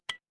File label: ui alert